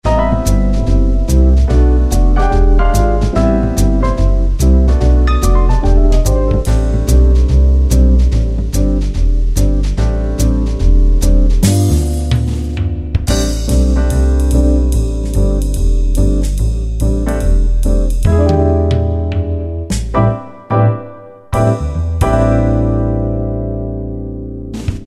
Combo Jazz, Yamaha QY70 Preset Styles

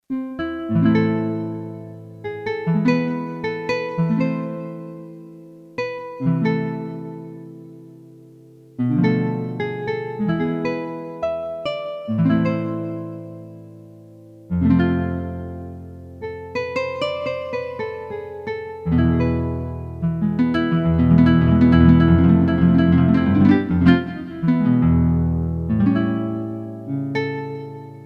Guitar

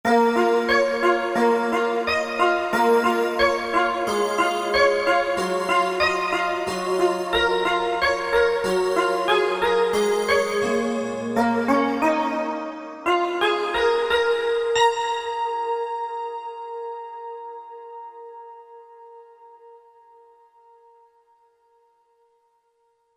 Dimly, Korg MSC-1S Variety Combinations

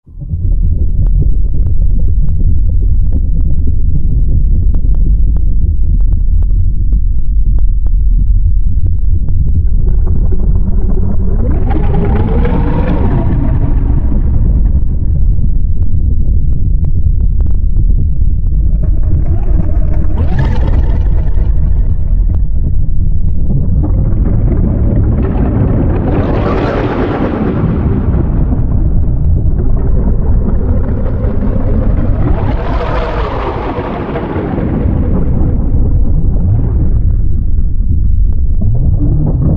Ocean Floor; Roland JV-2080

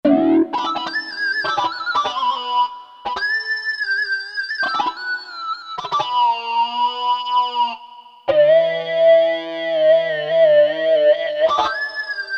FeedBack, Guitars (Rock), Korg DSS-1

